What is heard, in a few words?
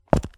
pas